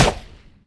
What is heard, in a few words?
GUN